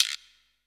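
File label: GUIRO, drum machines; JV-1080 DRMS; EdgeSounds; Drum Mashines VOL-1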